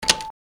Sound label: key